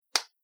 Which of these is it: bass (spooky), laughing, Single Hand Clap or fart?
Single Hand Clap